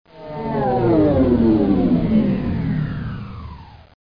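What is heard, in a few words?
Mech Power Down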